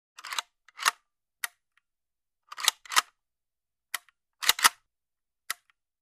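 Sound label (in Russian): перезарядка нажатие на спусковой крючок разряженной винтовки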